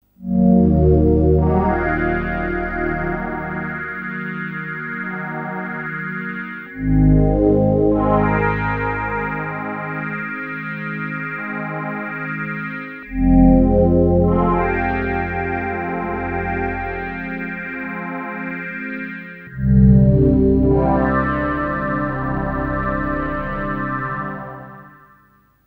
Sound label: mellow sweep